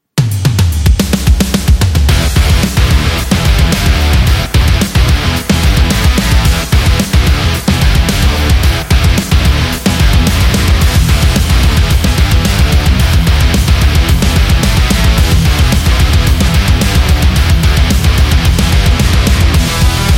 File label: Motorcycle, metal